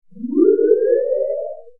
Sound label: power up clean; Star Trek Adversaries